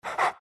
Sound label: pant small, greevil; courier